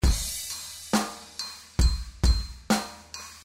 drum submix

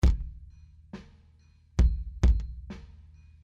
dry kick

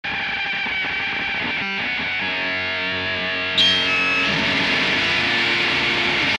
verse two guitar noise

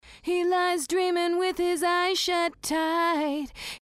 dry lead voc

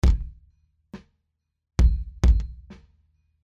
wet kick

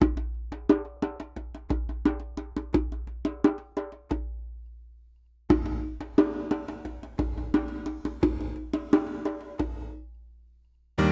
Gated

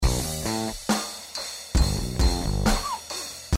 drum bass cymb

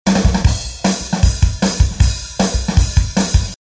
wet drums

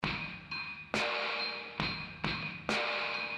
wet ugly snare

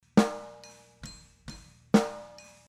dry snare

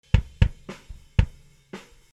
dry kick

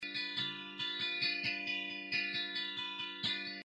phaser guit

